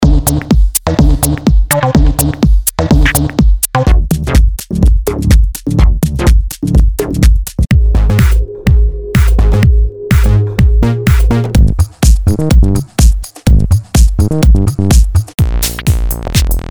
Minimal Tech House; Sample Magic

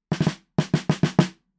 Snare 30cm; Austrian Audio OC818

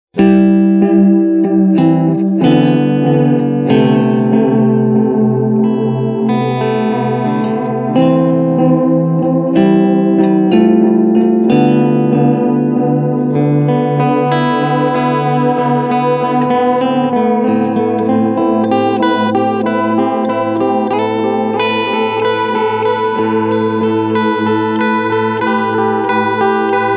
Long Delay Mod

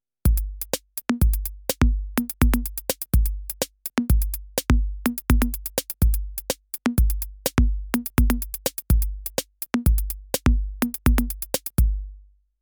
Tip Top Audio Circadian Rhythms Set Loop x3

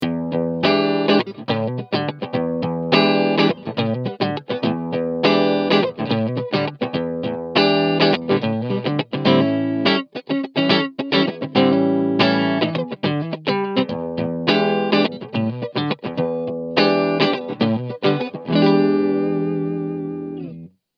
Clean; Bogner; Captor X; 2 Mikros front and back

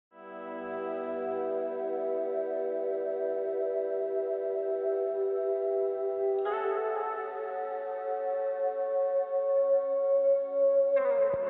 Cort MBM 2H high gain Sustainiac clean with FX

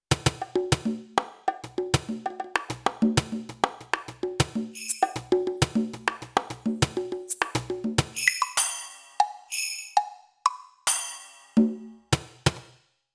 TD1 Set

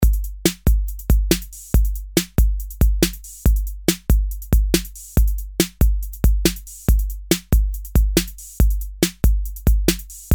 808 SS Preamp

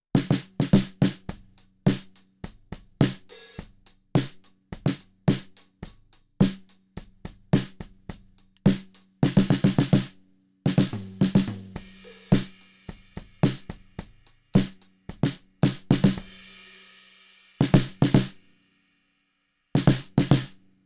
TBone CC100 Snare Drum close and Kit

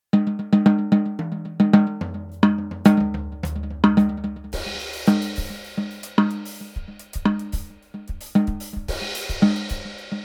EFNOTE PRO 703 narrow

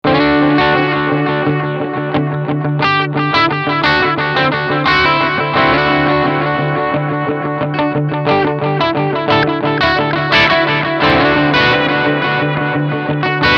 Space Echo

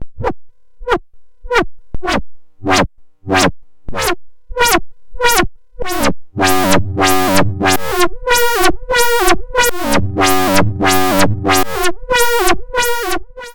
Pittsburgh Lifeforms SV 1 Filter; Nice Lifeform One

